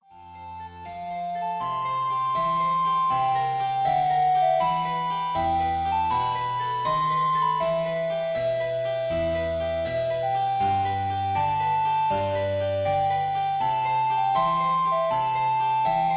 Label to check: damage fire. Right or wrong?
Wrong